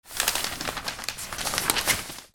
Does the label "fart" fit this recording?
No